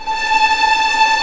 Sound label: A5 TREM, VLNS TREMOLO, String; East West; The Ultimate String Collection